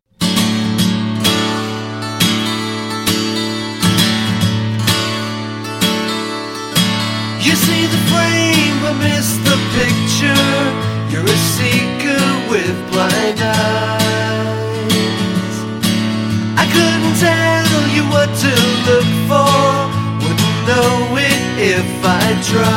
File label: Acoustic Mix